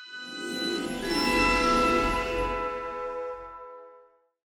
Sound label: freespins end transition; PushGaming; Peter And The Lost Boys; peter Pan